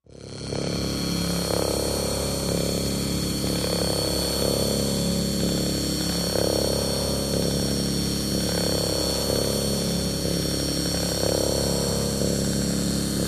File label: Plasma Shaper Machine, energy beams